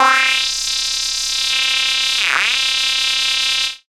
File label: syn, Dance Synths, Wasps; ProSamples vol.37